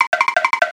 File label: comedy running; sound effects one